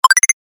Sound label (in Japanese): 決定 高音 レトロ; モノラル